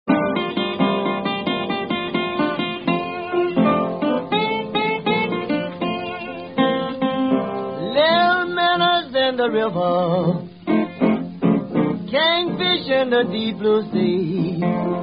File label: Kingfish Blues